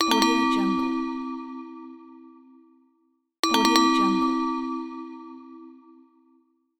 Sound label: light bulb